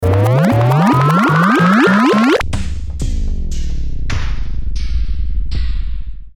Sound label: Ascend Scramble Beat Power Down; Ascending